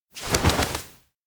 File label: flap; tsumire; anemoi